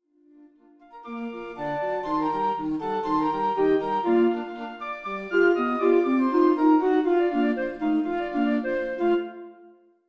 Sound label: flute quartet; FUCIK; The old grumbler